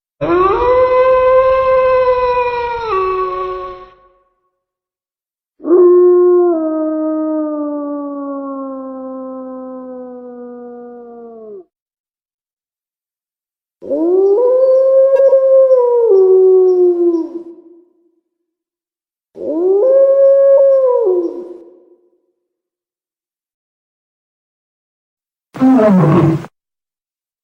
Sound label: sound effects werewolf